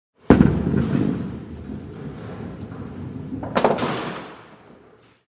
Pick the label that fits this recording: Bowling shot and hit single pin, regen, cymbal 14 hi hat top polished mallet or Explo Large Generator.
Bowling shot and hit single pin